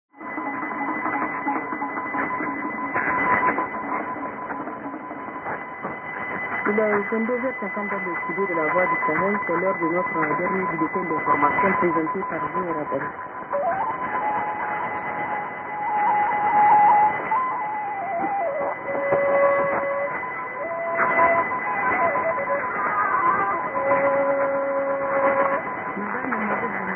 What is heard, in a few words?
9705kHz; La Voix du Sahel